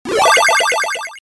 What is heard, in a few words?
rune